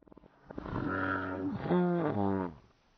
squid idle; npc

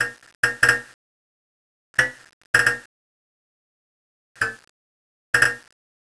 sparks; ambience; svencoop; AoMClassic

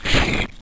attack, spitter, monsters

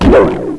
pit drone melee attack; sectore

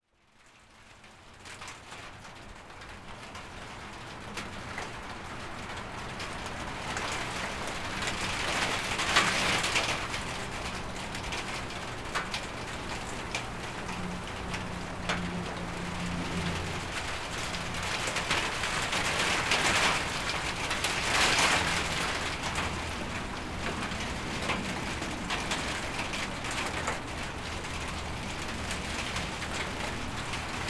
rain on carport in morning

